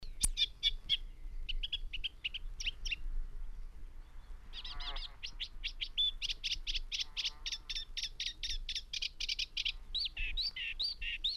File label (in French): chant ac scir; Rousserolles et phragmites